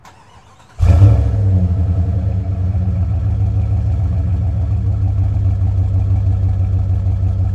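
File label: Truck Cherokee Start Idle Loop; SFX Kit; Trucks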